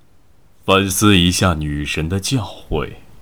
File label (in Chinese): 失败, BattleCry; ycrpg; 大胡子